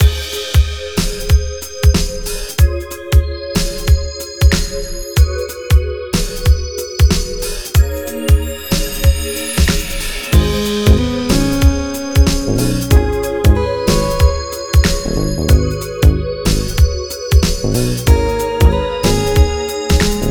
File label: full, 93 bpm, nu dance electro; Zero-G - Soundclash Nu Dance Electro; Acidized Wavs; Construction Kits; Smooth Circuitry